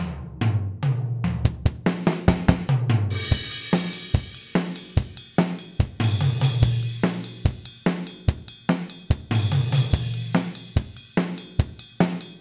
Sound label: hard rocker